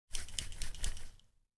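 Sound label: sounds of hairspray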